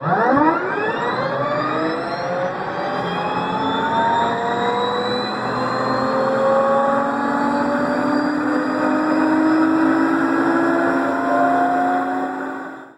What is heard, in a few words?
turbine start; War Thunder